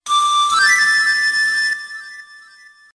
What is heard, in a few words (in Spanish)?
whatsapp flauta